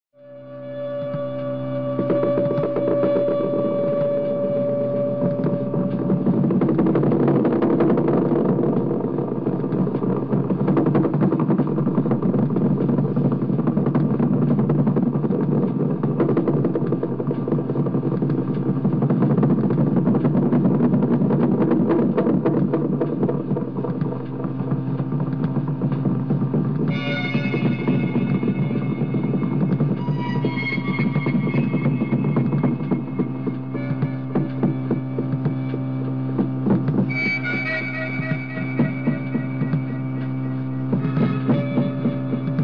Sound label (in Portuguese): percussao